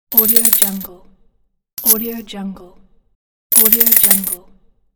coins pack

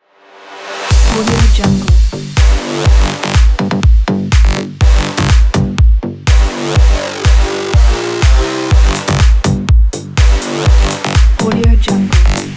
techno hard sport

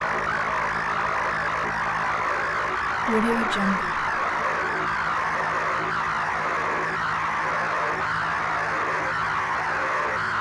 rizzy tone undulating constant low pitch hum

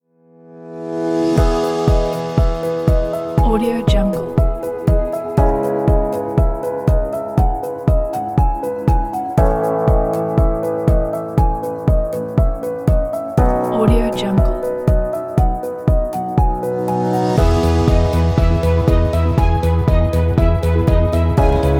the upbeat corporate

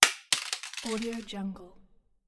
throwing crumpled paper

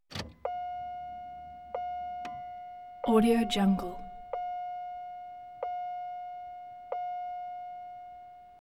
ignition alarm

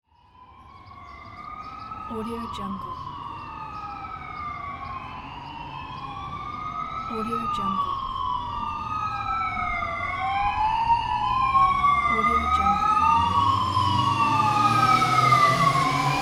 city sound; lfo-sound

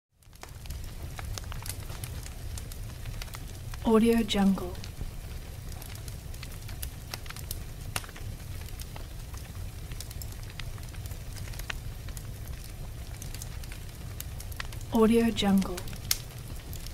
fireplace

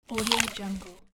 biting an apple

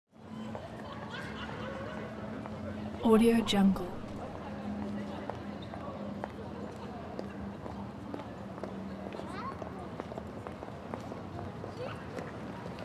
atmo city calm park people dog; smartsoundfx

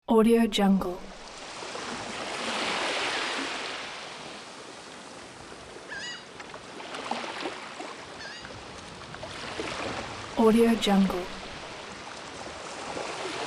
calm ocean waves

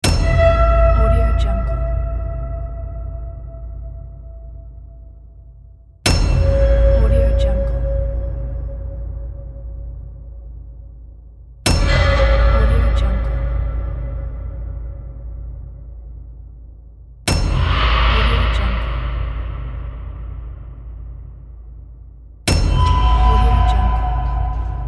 horror impacts; epix inc

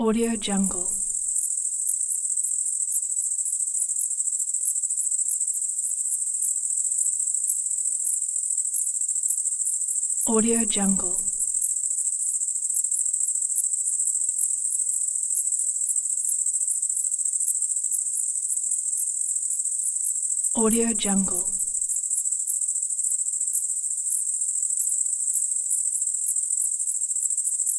cricket

